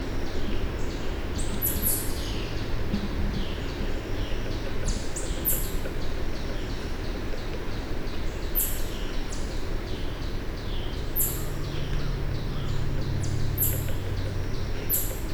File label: Chipmunk Woodpecker